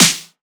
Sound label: Snare, techno drum shot